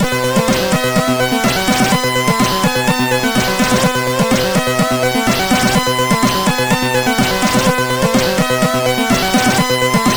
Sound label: Trance, SID 8580